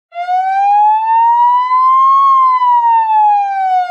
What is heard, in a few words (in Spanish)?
ambulancia